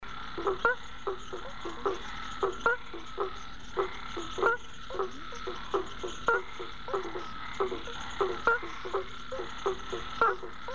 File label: Hypsiboas faber; Juquitiba; SP